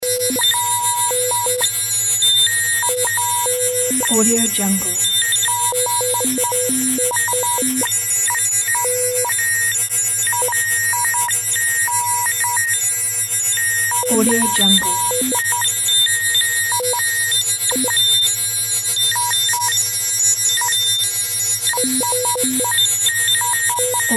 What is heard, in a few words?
electronic data processing